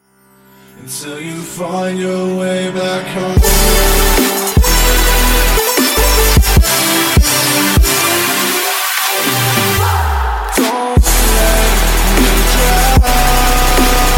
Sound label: Trap